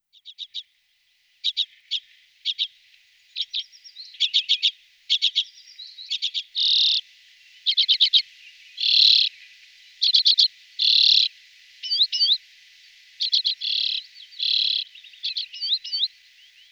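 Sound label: Sizerin flammé - Redpoll; Les oiseaux de nos jardins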